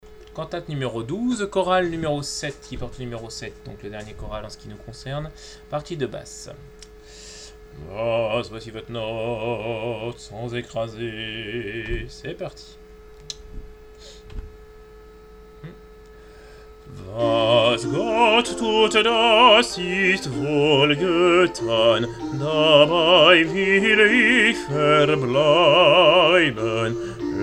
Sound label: basses; bwv 12 7